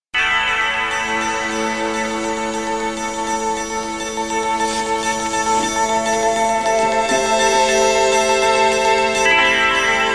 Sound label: dramatic; Suspense